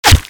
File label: knife hit; thanatos3; weapons